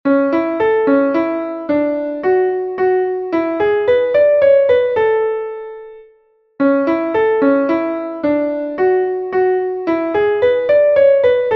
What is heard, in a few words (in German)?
klavier; MelodieMeister